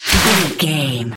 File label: water spell impact hit